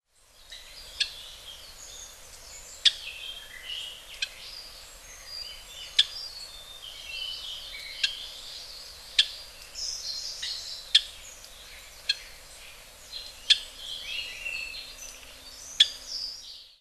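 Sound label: Japanese Grosbeak, Hyogo; ikaru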